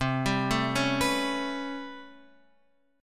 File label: A 9th down Guitar Standard, chordshape; A9/C; arpeggiated; JGuitar